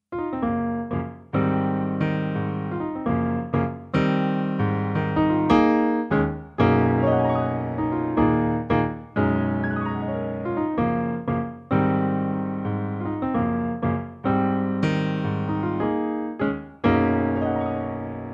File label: blues bridge